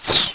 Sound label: Swish; Ultima III